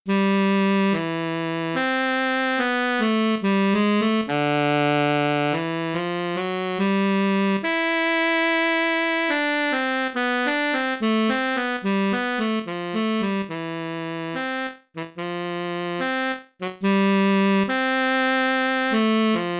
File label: Bari Sax slow, etudes; Region 23 Middle School Etudes for 2026-27 (Year C)